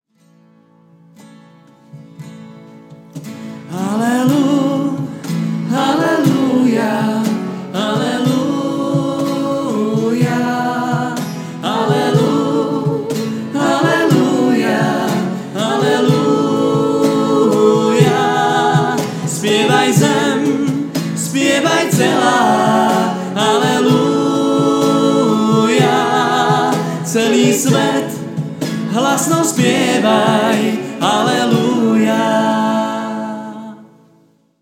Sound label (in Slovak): Aleluja spievaj aleluja; pruske piatok sv jana apostola a evanjelistu